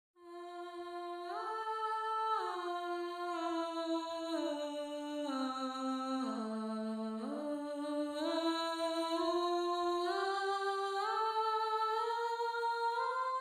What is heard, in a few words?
Alto, counterpoint